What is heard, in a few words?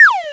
Plant dead